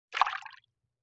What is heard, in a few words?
Water Splash Small; Vintage Cartoon; Foley